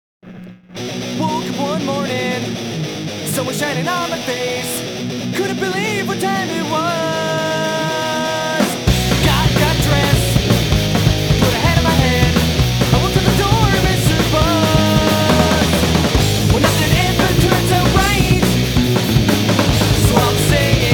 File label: Acoustic